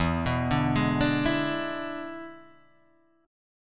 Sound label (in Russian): Perebor E7